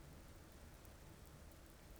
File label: pulses, BALAENOPTERA; MONACO 2022-07